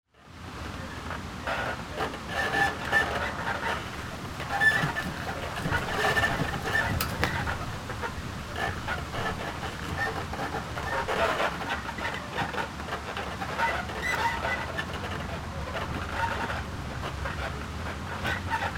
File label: awning flapping in the wind